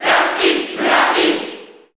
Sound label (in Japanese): Cheer Japanese; Dark Pit; SSB4; SSBU